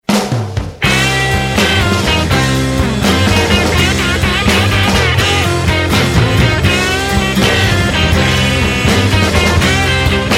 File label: Big Guitar